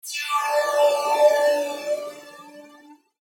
Logo Swirl Descend; Sonic Logo; Blastwave

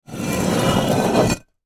Rock Slate Movement, Rock Slate Movement Slide; Materials; Foley; Blastwave

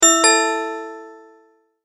multimedia tone verified

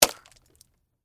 Impact Egg Drop; Texture; Vegetable; Drop Sound Effects; Objects

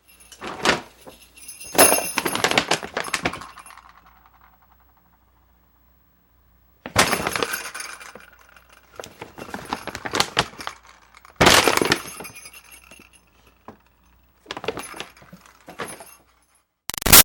Foley Shopping Bag Paper Jingle Bells Moves; Materials; Big Room Sound

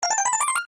UI Commit, User interface feedback sounds; Computers (Sound Effects:Electronics)

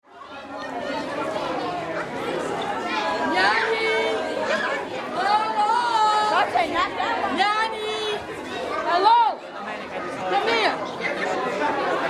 Human Crowd Children School; Backgrounds; Schools

